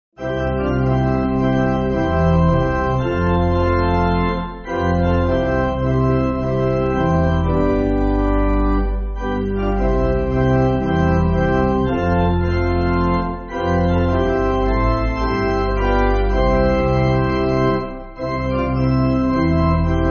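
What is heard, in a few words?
Pipe Organ, Music Backing Files for Churches; Frightened Sea; Centenary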